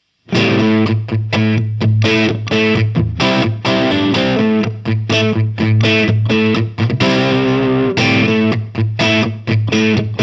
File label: Rickenbacker 350V63 Crunch Neck Middle Bridge Pickup